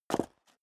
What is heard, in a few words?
step high heels concrete grit single stair up; schritte; Footsteps; menschen